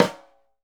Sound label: SNR, Synclavier Percussion 2 - World & Orchestral